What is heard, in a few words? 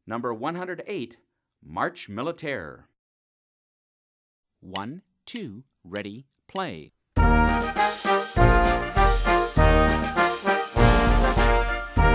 march militaire